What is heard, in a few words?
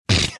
snorting